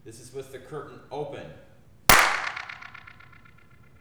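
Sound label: open clap stage, Flutter Echo; handclap; curtain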